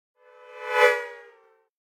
Car Horn Doppler By - Fast And Short Passing Car Horn - L to R; Hollywood Edge; sound designer's toolkit v2